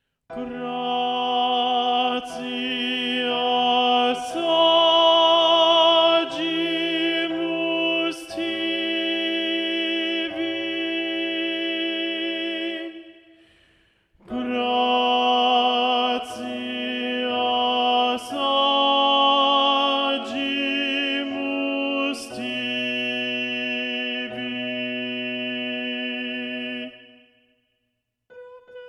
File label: Tenor Predominant; Vivaldi